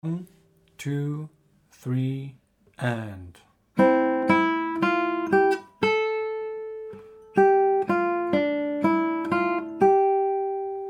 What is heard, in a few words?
60bpm, guitar